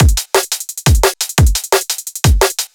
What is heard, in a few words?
Drum Loops, 174; M8; Electronisounds